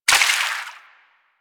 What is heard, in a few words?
Water Sprayer Surface Impact; Warframe